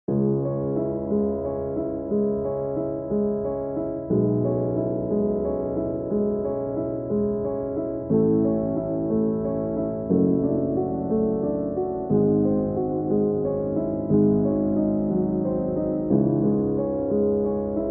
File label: studio pianos sonata